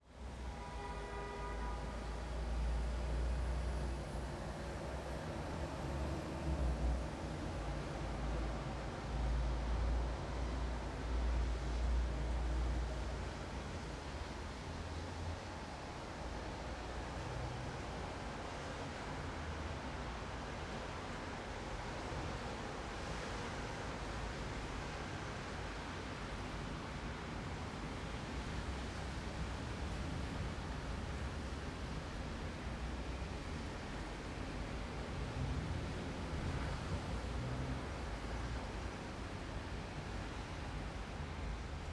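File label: Urban City Evening Rain; Marek Klemczak; ARTA Cinematic Sound FX Pack; Chef Audio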